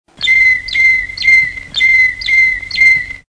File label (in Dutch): fluiten waterslager malinois